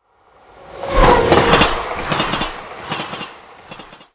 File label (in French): train rapide